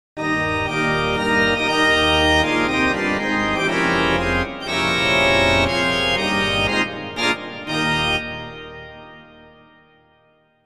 church organ; kontakt player; sibelius

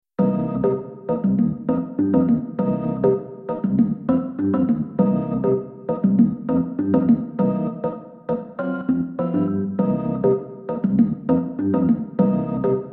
Rock Organ; sibelius sounds

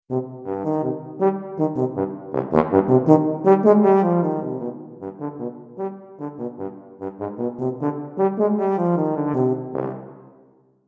Tuba Solo; sibelius sounds

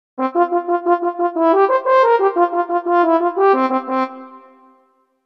horn solo; kontakt player; sibelius

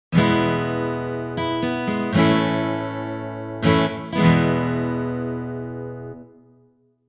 steel guitar; rock and pop

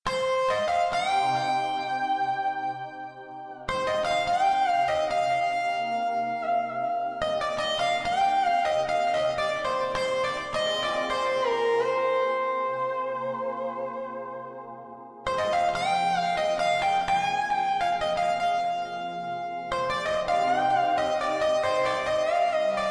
Electric Guitar Distorted; sibelius sounds

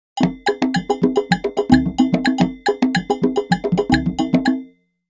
cuban; world music

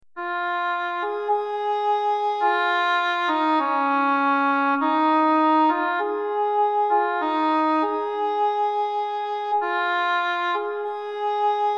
Cor Anglais; sibelius sounds

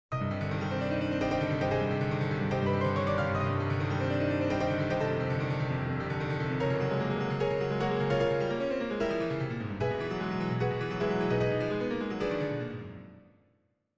piano study; chopin

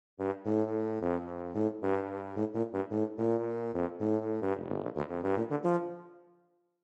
tuba brass band; sibelius sounds

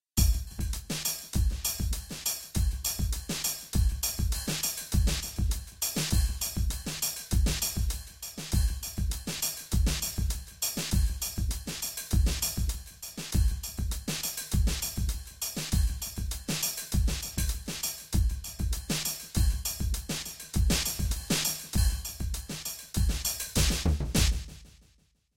Goa Drum Set; sibelius sounds

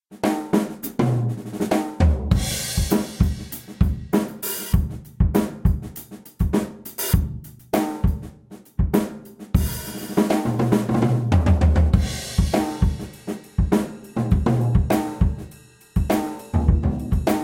GM Drum Set; sibelius sounds

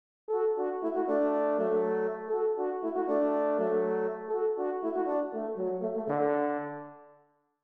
horn section; hebrides

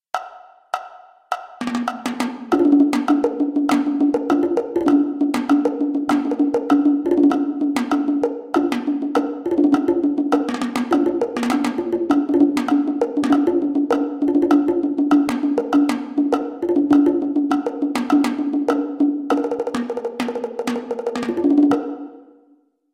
Latin Drum Set; sibelius sounds

